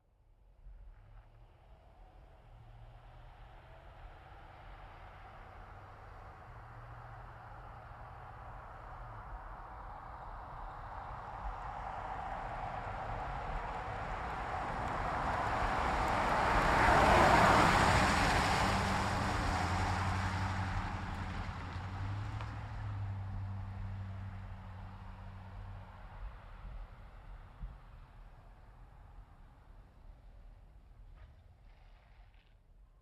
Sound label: Truck GMC Savana By Corner Slow; Vehicles